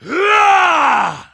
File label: troll attack; Halazzi